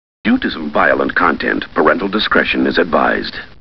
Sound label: ouch